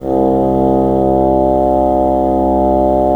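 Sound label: wind bassoon; LA Composer vol. 3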